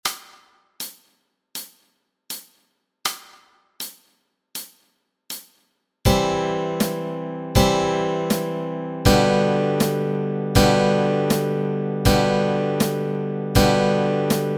Chords Track, Easy Guitar and Ukulele

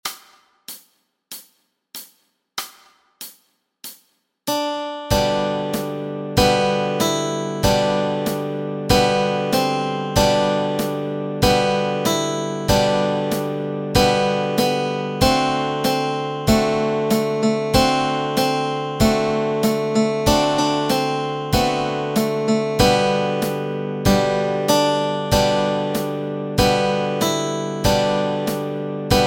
Melody and Chords Track

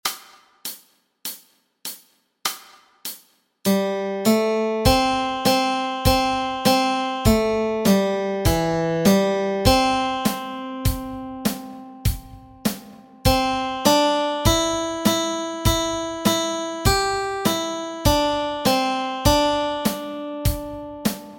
melody

